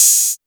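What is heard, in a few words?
Open Hat; Drums; HiHats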